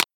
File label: tap; XingYunJinChan